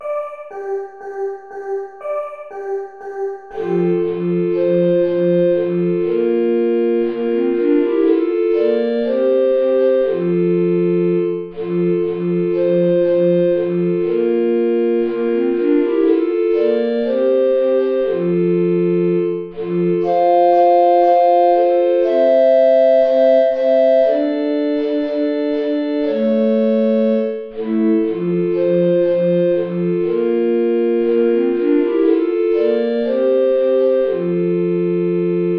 play along without soprano recorder; steuerlein johann; mit lieb bin ich umfangen

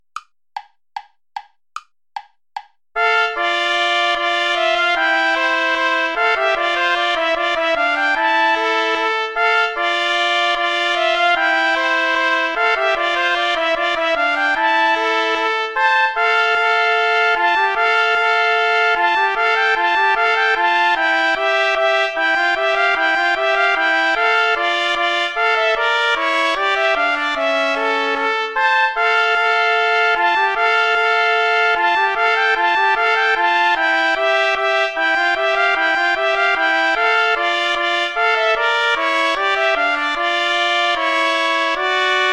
bourree play along without trumpet; praetorius michael

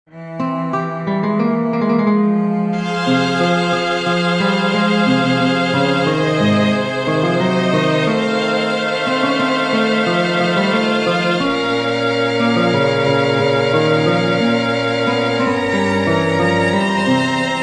recitative for guitar strings; bach johann sebastian; antwortete und sprach

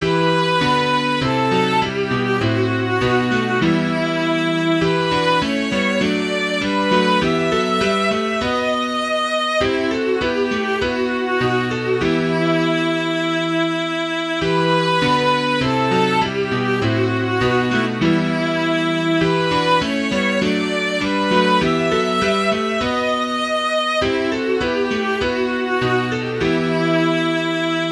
for viola piano; bach johann sebastian; weicht ihr trauergeister

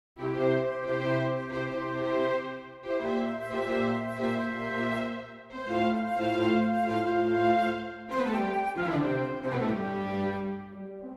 for winds, strings; Feel the god

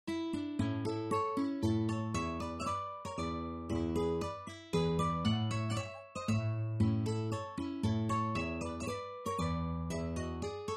landler duets; giuliani mauro; 16 landler duets

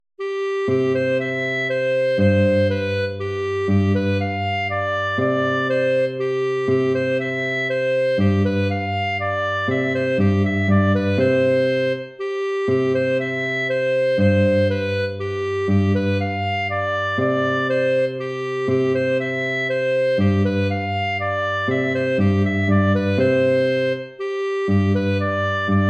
waltz; Carulli Ferdinando

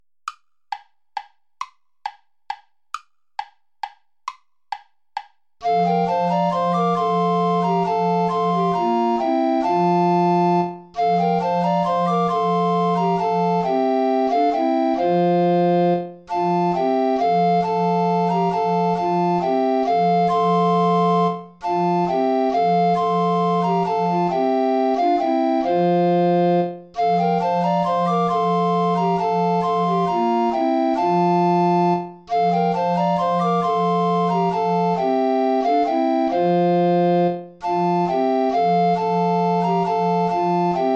volta play along without tenor recorder; praetorius michael

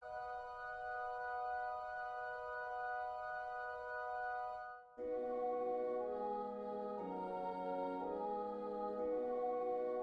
ave maria per mezzo soprano e organo

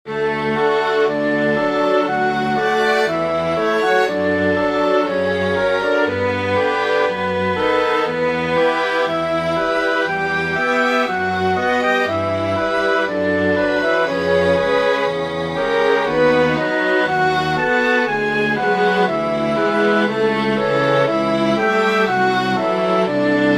grand choeur for winds strings; franck cesar

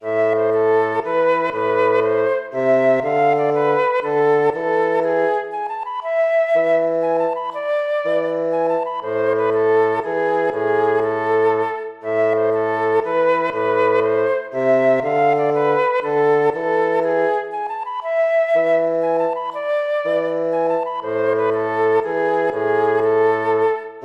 baroque minuet minor

